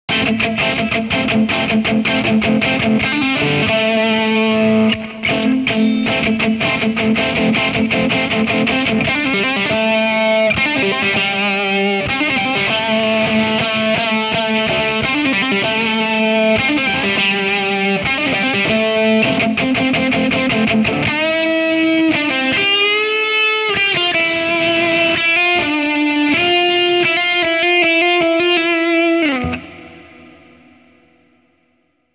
les paul amplif; chiqui audio

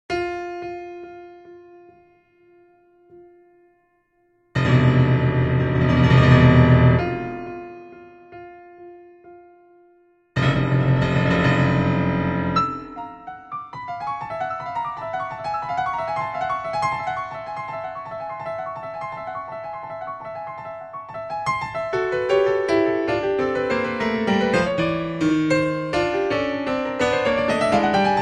massive impro, f grand; f grand 278; uvi

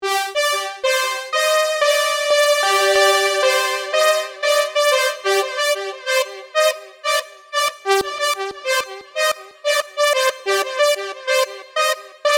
bounce; bluewave by music sound software

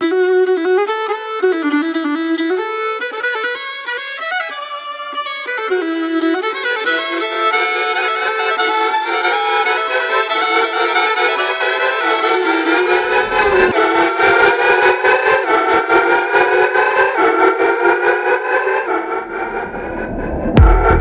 glacial reverb; 23dsp

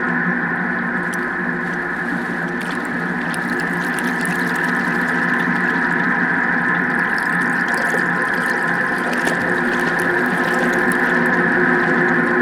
water wet with airspace, hybrid reverb and delay; modeaudio

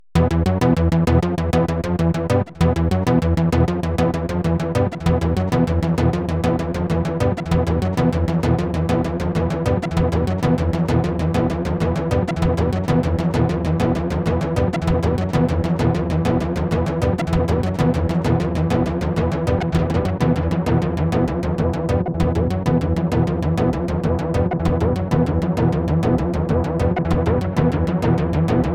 synth bass, reactive delay; septimbral

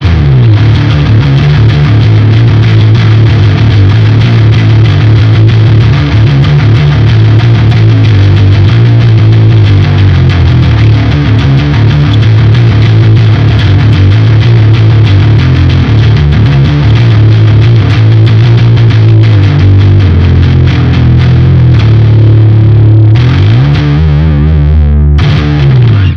bass sludgy

